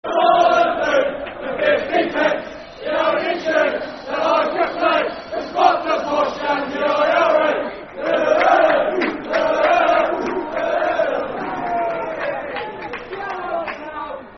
Football Chant (Soccer Song); When I Was Young; Northampton Town Ntfc

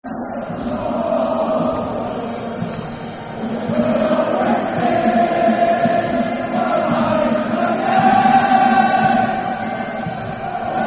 Football Chant (Soccer Song); Scotland Football Team; Scotland Scottish

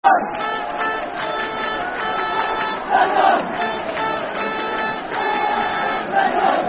Horn, Football Chant (Soccer Song); The Dons; Aberdeen Afc

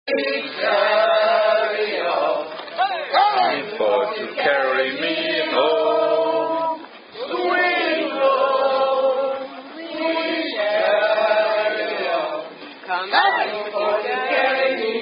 Hash Hymn Reggae Version; Fh3 - Swing Low Hash Hymn Frankfurt Reggae Version; Silent And Regea Versions Ends In Oggie Oggie

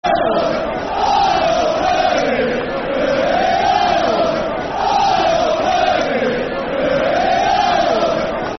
Aye Oh Bury, Football Chant; Bury Bfc

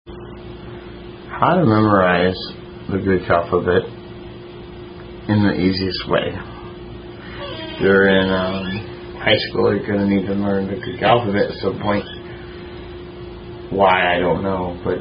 Greek Alphabet Memorization Song; Tune Yankee Doodle Dandee

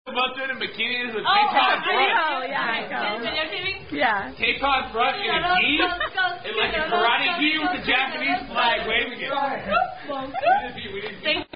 Japanese Drinking Song; Down-Down Song

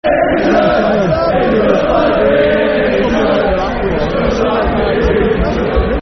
Football Chants (Soccer Songs); Just Like Being In Church; Wigan Wafc; fanchants

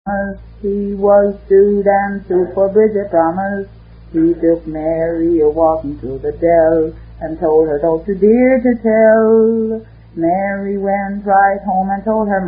Sung; Handsome Harry

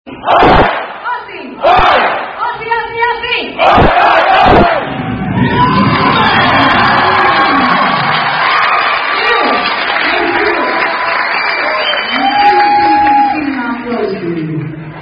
Aussie Chant, Aussie Aussie Oy Oy Oy

